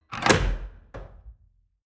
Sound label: mechanics small reverberant